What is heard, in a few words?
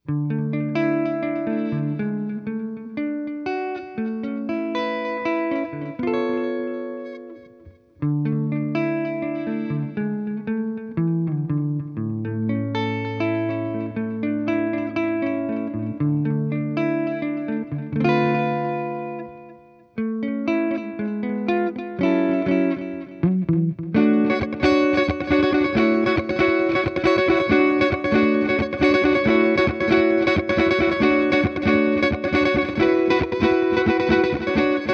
Guitar Delay